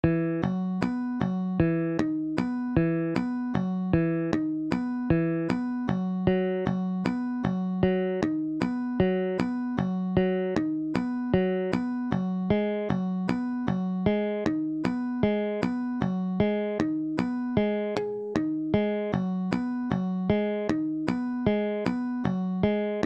Fingered Bass with Harmonics; Bassesland; Syntheway

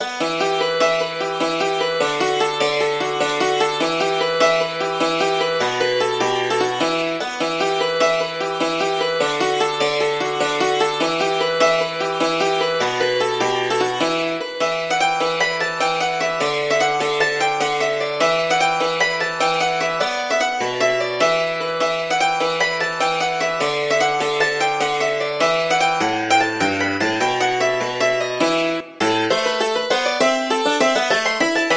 Acoustic Hammered Dulcimer; Chordophonet; Syntheway

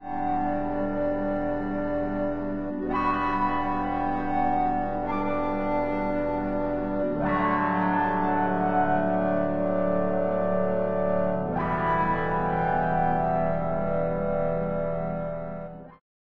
Vocal Spectrum; Waverator Spectra

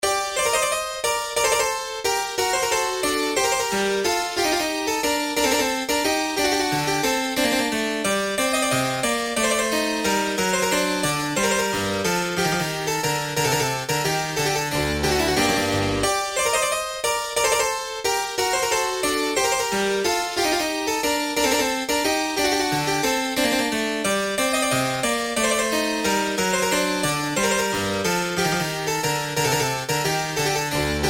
Harpsichord; Pieces de Clavecin; Les Abeilles; Francois Couperin; Syntheway; RetroMagix Harpsichord

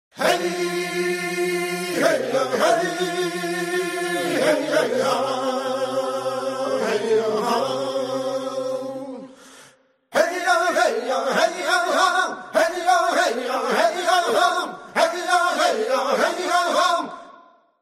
Dual Delay Canyon Echoes Dry; ClonEchoes; Syntheway

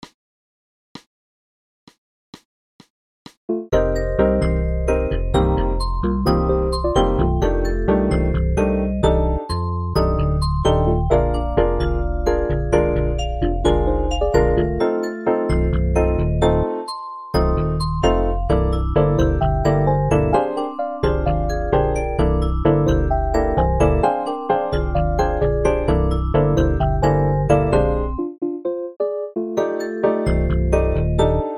Vibes EKeys Rhodes; Syntheway

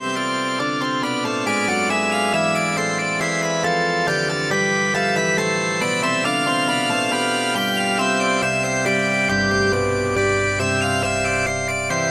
Organ; Waverator Spectra; Celestial

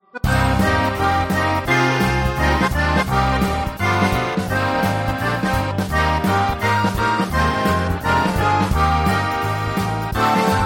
Hohner Accordion Bass; Akkordica; Bassesland; Syntheway

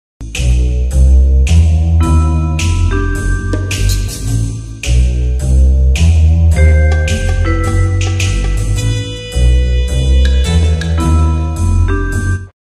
Mystery tone

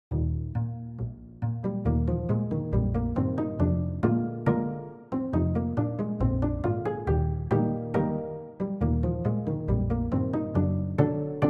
Pizzicato, Upright Bass Pizzicato, Flute; French Horns; Bassesland; DAL Flute; Syntheway

